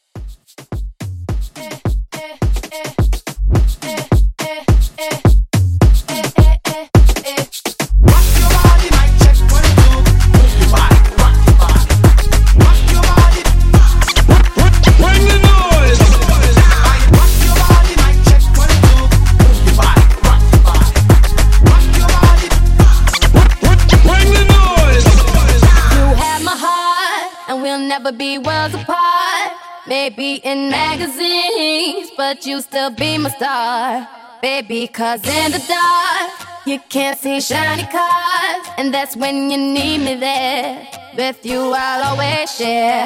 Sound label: Moombah Hype Reboot 106 CLEAN